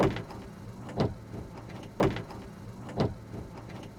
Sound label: Wiper Washer; SIMUZENSOUND